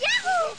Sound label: "yahoo"; ooh ahh